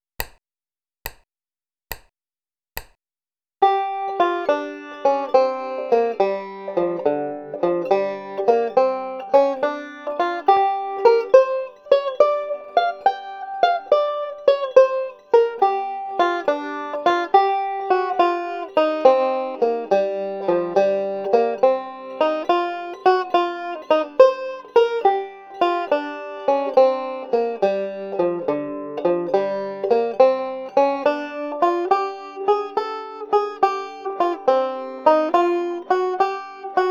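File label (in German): 12 bar blues mit solomelodie, banjo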